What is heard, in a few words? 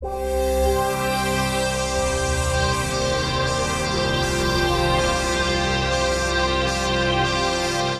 Pad Loop (120BPM); musicradar